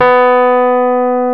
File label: PNO, Rhodes Mk.V; Keyboards of The 60's and 70's